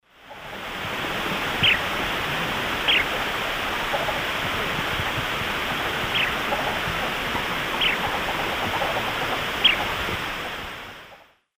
Charadrius collaris, calls; S. America; Eastern Lowlands

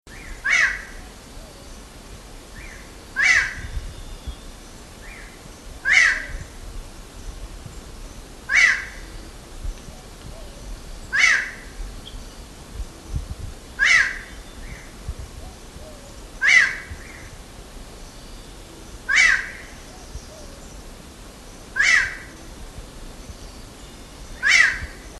Hooded Tinamou; S. America

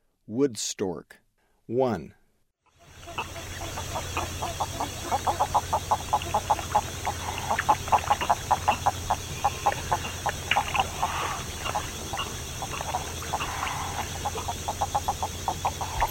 Wood Stork; S. America; Eastern Lowlands

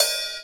ESSENTIAL PERCUSSION

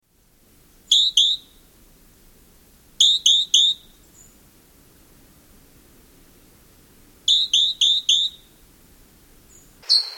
Azure-Hooded Jay; S. America

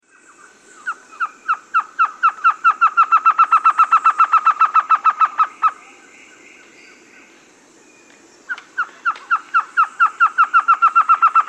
Baird's Trogon; S. America

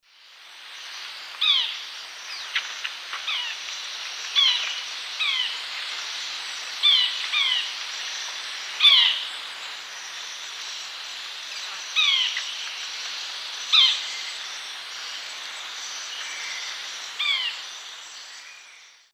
Myiozetetes luteiventris, call; S. America; Eastern Lowlands

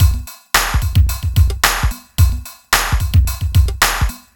r n b kit 110

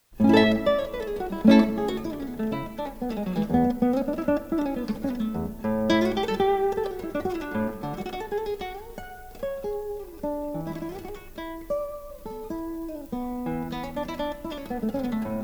Guitarreo; Classical; The Art Of Segovia

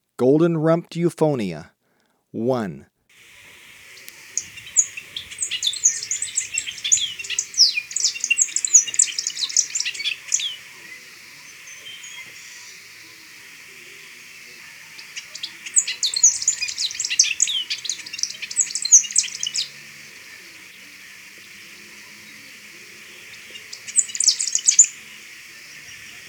Golden-rumped Euphonia, Birds of Ecuador; S. America

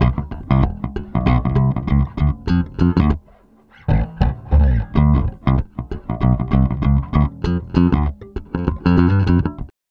Bass, RnB

